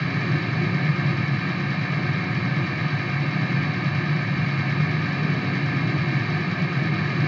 ambient battle; World Of Warships

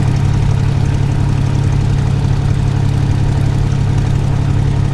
mustang cockpit, engines; War Thunder

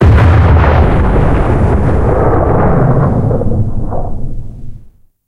cannon 122 plus tail far

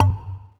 cube ball hit

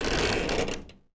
swing strain generic

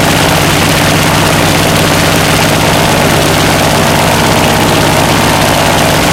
zero side, engines; War Thunder

